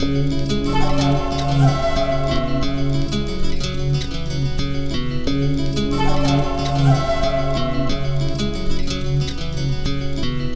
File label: Melody, Hip-Hop